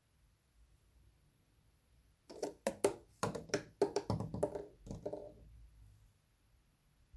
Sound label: Fircone in window